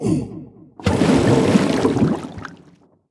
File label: boss death